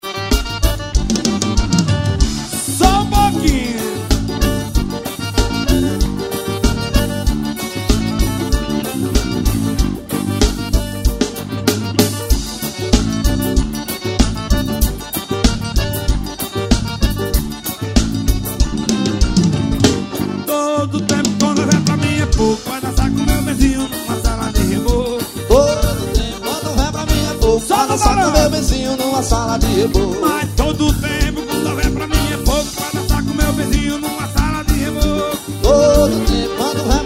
acordeon

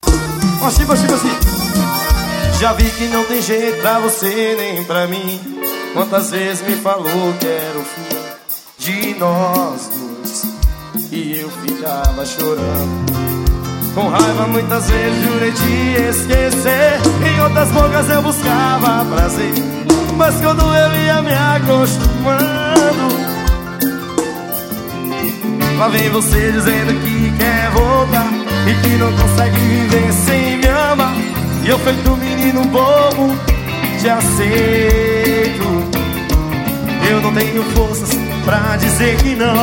forro; São José dos Ramos PB

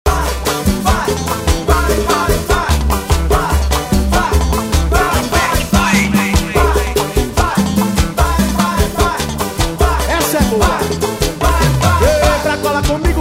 viola

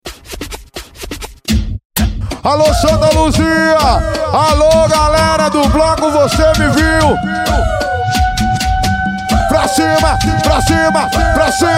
funk

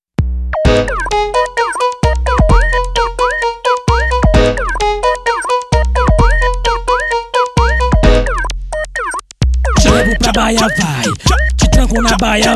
funk baia com sirene

